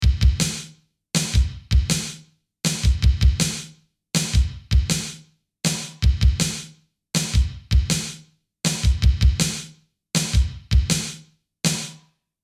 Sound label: Superior Drummer 3 Kit; Hitmaker Billys Number Kit